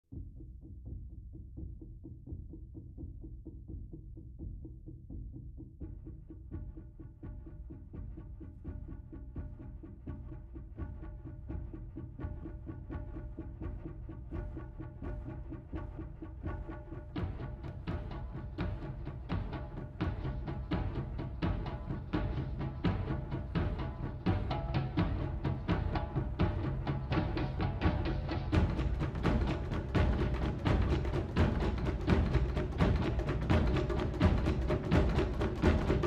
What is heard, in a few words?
drums; jumanji